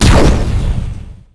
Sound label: Sonic Fire, Sonic Gun